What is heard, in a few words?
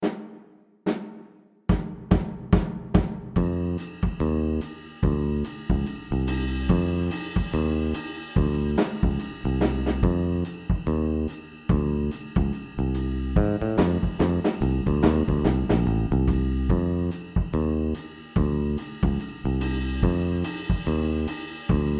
with bass and drums